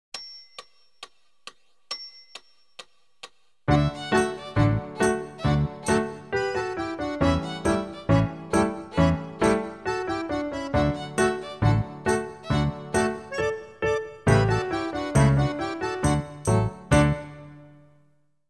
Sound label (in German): irische melodie